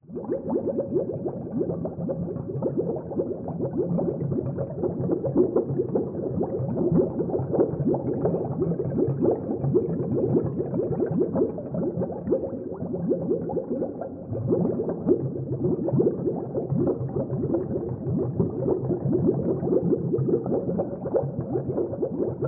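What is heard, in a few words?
ambience Battle Village; Rabbids Multiverse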